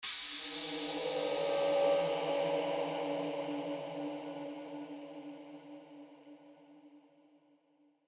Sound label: musical haunting